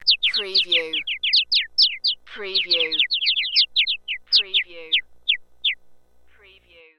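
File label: Birds Chirp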